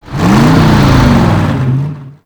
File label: Lasrian APC Move Start; Enemies; Warframe